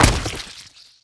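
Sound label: impact horse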